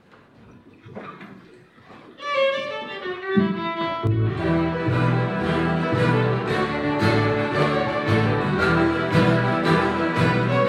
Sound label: Swallowtail Jig, fiddle; bristol valley theater performance